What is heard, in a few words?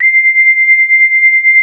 LEAD C5, Analog; USB Soundscan vol.49; AKAI; ARP